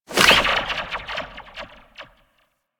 Zipline Release; Gadgets; Parkour; Warframe

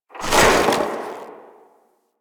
Worker Ice Lever Push; Warframe; Venus Open World; Venus Solaris United

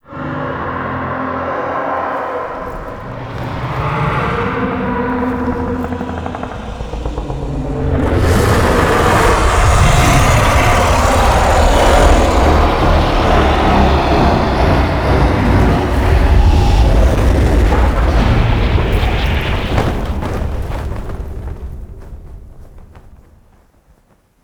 Dragon Establishing Shot; Duviri Quest

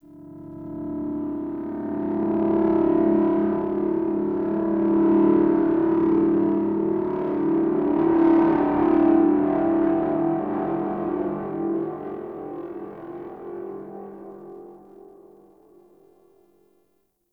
Gritty Pulsing Drone; Warframe; Ambience; Nineteen Ninety Nine

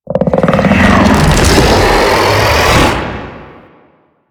Octopede Horrors Start, Sounds Enemies; Warframe; Man In The Wall

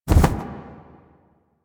Owl Idle To Pre Hover; Duviri; Animals

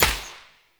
Hunter Bow Shot, bows; Weapons; Enemy

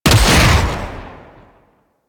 Flame Spear Fire; Grineer

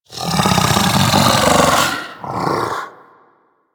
Kubrow Vocal Tranquillized; Warframe; Hunting Critters; Orokin Kubrow

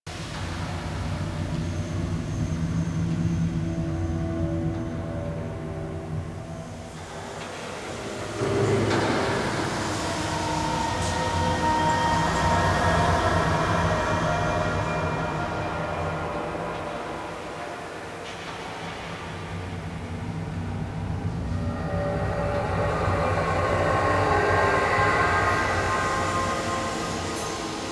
Ambience Loop, Interior; Warframe; The New War; Zariman

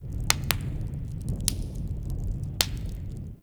Smithy Forge Fire Crackle; Soul Plane

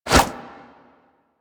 Inf Punch Whoosh, Infested; Warframe; Weapons; Inf Kick Punch

